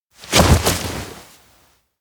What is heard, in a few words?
sitdown mountain; anemoi